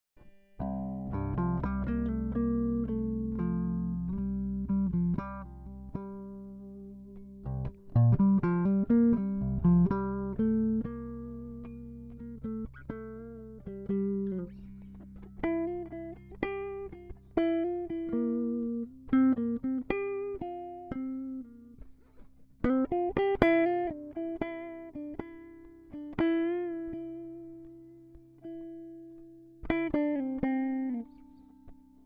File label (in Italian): 7 4 Improvvisazione